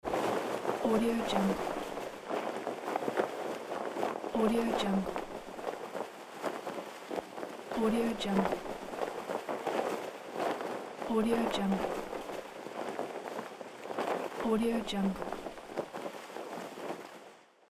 kite flapping in the wind

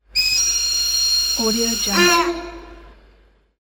train whistle

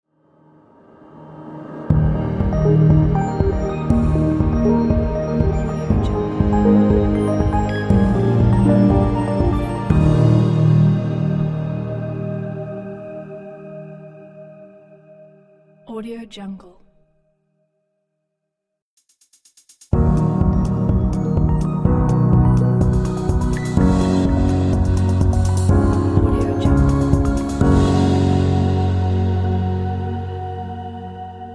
logos pack

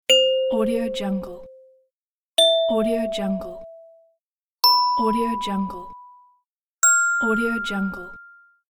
idea bell

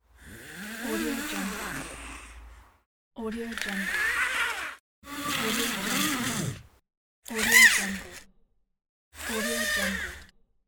climbing rope

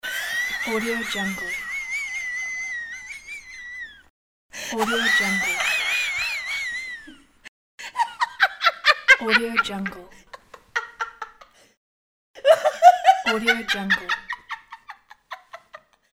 laugh of female

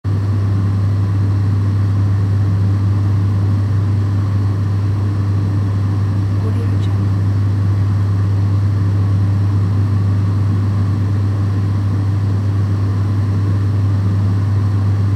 fan ventilation

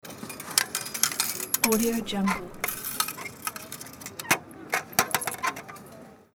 ticket selling machine

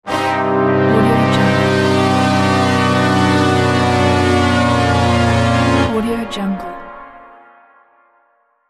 spiraling down; marbury media

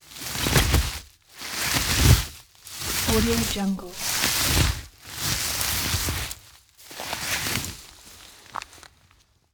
body drag on farm lane

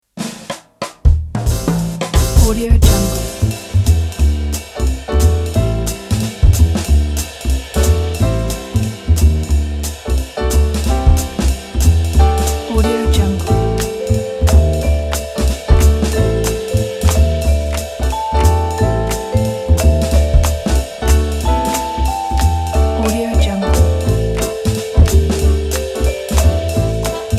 swing and fun jazz

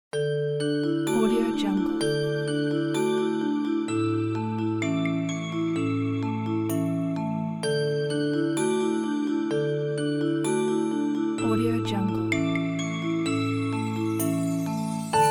baby christmas

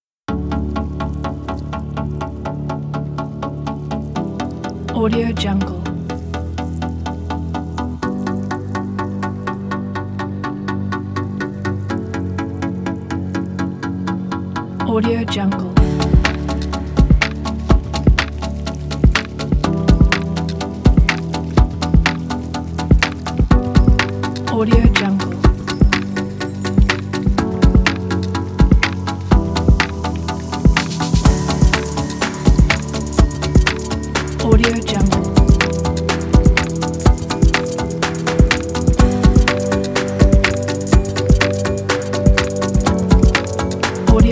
electronic ambient breakbeat; audiocoffee

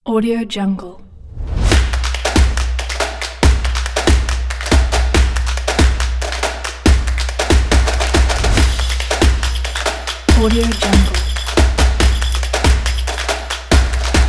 fast beat kit

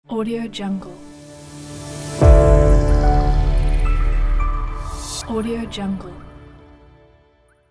elegant logo

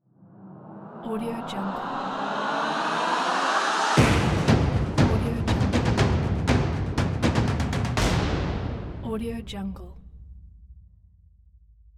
adventure cinematic ident

